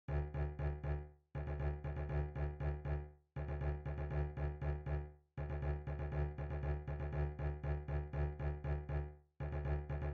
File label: Bass; Samson and Delilah